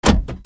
CARS

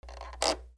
SQUEAKS

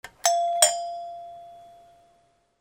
BELLS

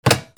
PHONES

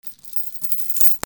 FALLING

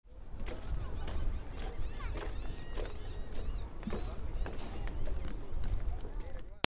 FOOTFALL

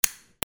FIRE

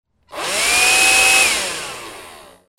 HOUSE

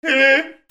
PEOPLE

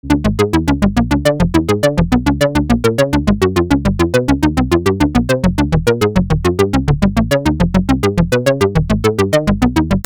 MUSICAL

